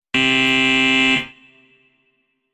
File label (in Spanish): incorrecta